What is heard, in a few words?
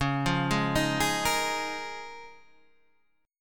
C sharp 6th Add 9th C sharp down Guitar Standard; arpeggiated; C#6add9; JGuitar